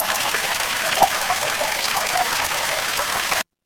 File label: water stream